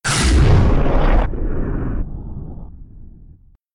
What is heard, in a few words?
whoosh; Whooshes and sweeps